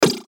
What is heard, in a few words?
CLICK BURST DIGITAL; Clicks (Sound Effects:Multimedia)